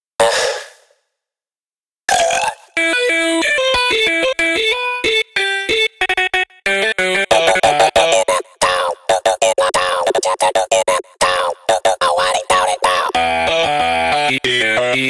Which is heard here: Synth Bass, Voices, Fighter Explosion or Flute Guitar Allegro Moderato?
Voices